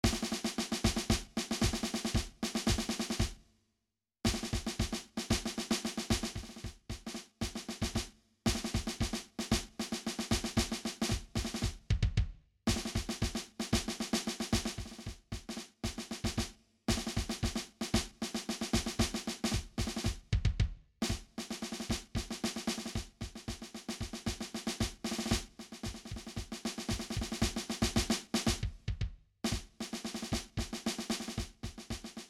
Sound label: drums on tour